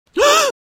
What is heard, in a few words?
Gasps Woman Close Perspective; Human Sounds